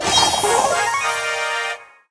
startwirl; Brawl Stars

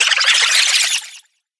rats; ash; Brawl Stars

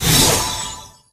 gadget tele; max; Brawl Stars

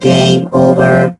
die; rick; Brawl Stars

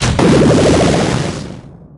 tnt ulti; Brawl Stars

